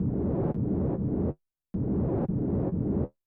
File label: DANGER NOI, Techno 138 BPM; Best Service ProSamples vol.54; AKAI